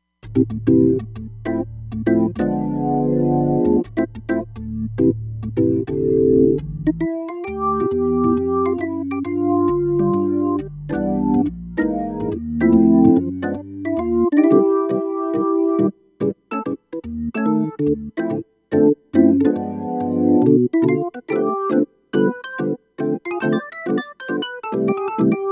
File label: B Keyclick, Roland Fantom XR